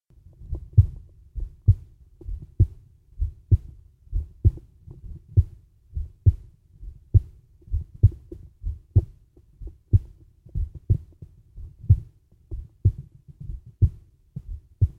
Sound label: heartbeat